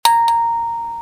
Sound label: Oppo Reno 4 Pro 5G Message Ringtone